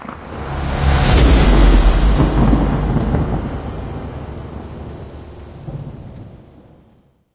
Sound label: Level up thunder